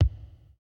kick, house

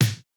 snare, snare house

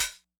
chillhouse open; Electro

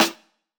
90s hiphop snare; Reference beat bpm90

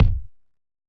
Hiphop kick; Reverb+EQ+Comp+Limit

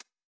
hihat; Electro; futurefunk

